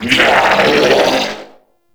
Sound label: Scream; Warframe; Amalgam Override; Corpus